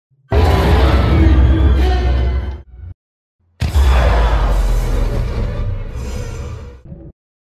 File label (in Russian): skrimery